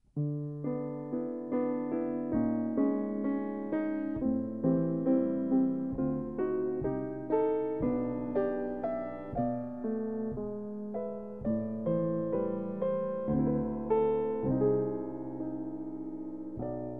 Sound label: Stradivarius al piano